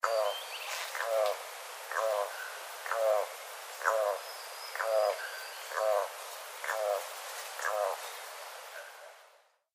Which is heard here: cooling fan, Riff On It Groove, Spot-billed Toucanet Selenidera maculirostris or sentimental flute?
Spot-billed Toucanet Selenidera maculirostris